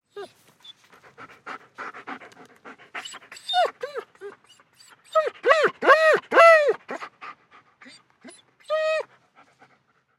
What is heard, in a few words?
Dog German Shepherd Pants Whines Excited; Dogs; Animals; Big Room Sound